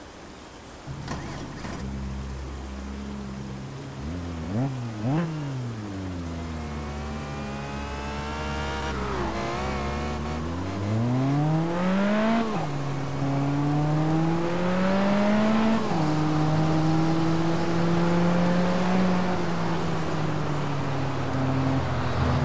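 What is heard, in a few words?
aston martin db9 coupe, car; TDU-1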